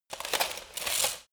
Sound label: light walk single